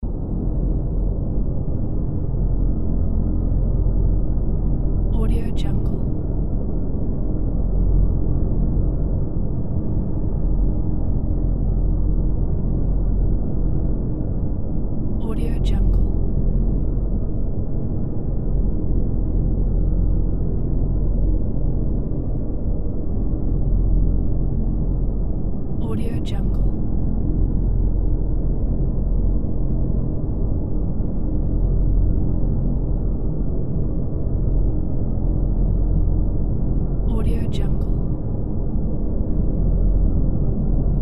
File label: deep drone ambience